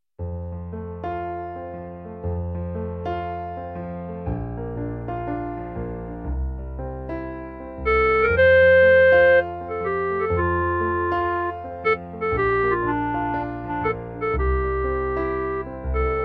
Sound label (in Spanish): CLARINETE; concierto musica ii eso